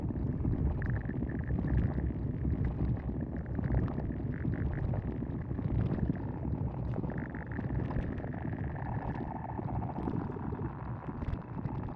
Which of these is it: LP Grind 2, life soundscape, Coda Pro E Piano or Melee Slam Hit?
life soundscape